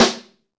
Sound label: snare, Roland